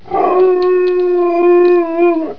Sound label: woo